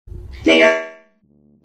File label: robotic nihhhhh